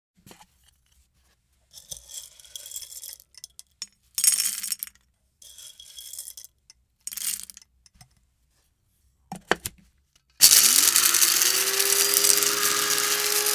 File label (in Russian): Kofemolka